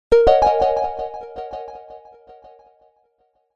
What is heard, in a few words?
Rise Message, Digi Delay; HTC